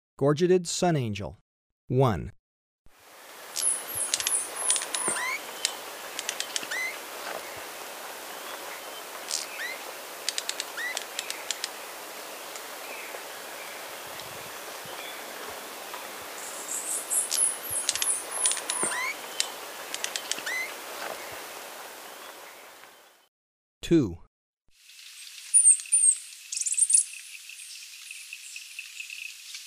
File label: Gorgeted Sunangel, Birds of Ecuador; S. America